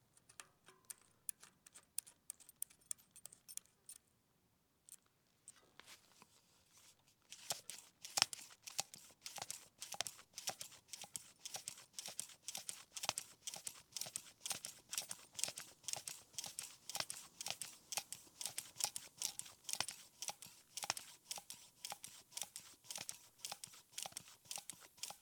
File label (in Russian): nasos velo zakruch nak, zvuk ruchnogo nasosa